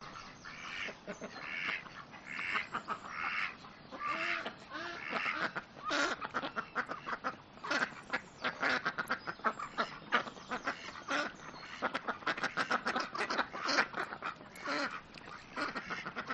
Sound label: urban farm hens ducks